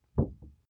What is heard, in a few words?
paper wobble low medium